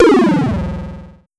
Pollard Syndrum

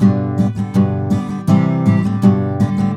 GTR, Celtic Flavours; USB Soundscan vol.42; AKAI

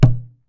CALF HIDE, AFRICAN PERC; AKAI S6000 CD-ROM - WorldSounds

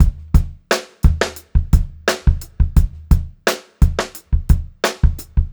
87 STR BEAT, Funk-Groove Drumloops; USB Soundscan vol.38; AKAI

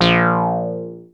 ARP 2600 A#2, synthesizer; Hollywood Edge - Giorgio Moroder Rare Synthesizer Collection

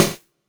SD, Dance Drums

